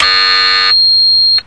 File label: painful buzz beep; Electro and Synthetic